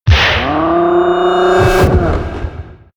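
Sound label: entiwood death, monster; Senillinea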